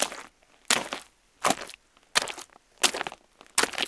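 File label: footsteps; Mafie 1 cz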